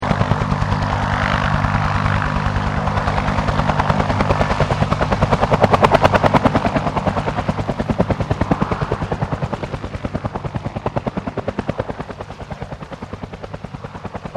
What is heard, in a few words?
HELICOPTER 1967 BELL UH1 IROQUIOS PASS LONG; RAN UH-1 Iroguios; Helicopters; Vehicles; Aircraft Collection